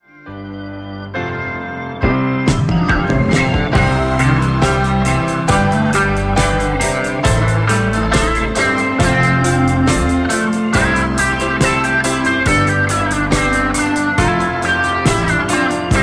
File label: leslie slow speed